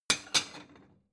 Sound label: plate small shelve slide